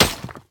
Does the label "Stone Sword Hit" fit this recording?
Yes